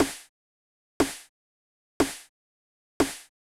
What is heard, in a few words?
TR909 Snare; hydrogen